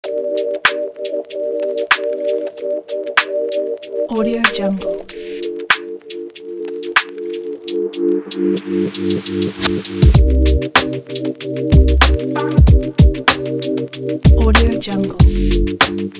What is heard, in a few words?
abstract beat